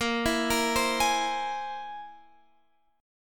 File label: C Augmented 7th Bb